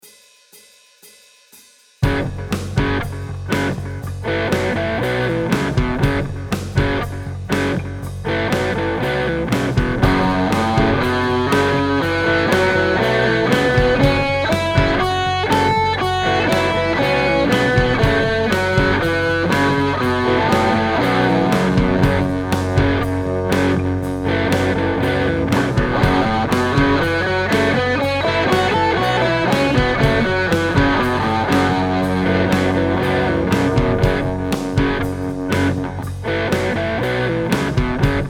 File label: Fm Double Stop Power Chord